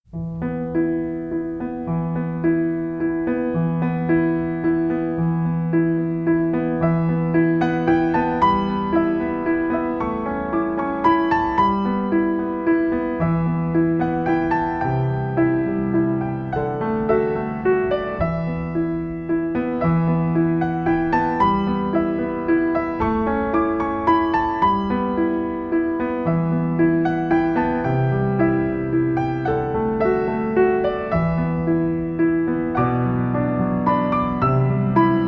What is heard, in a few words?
Music